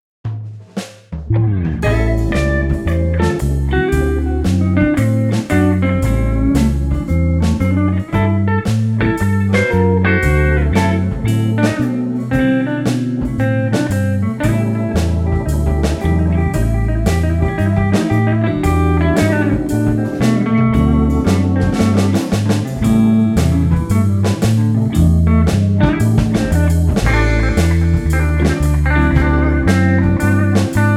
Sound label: Jazzy Blues